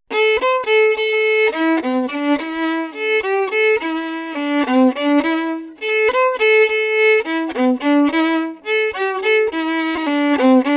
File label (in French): jour envie violon